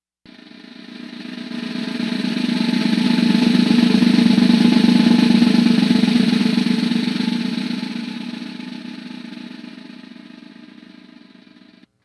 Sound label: drum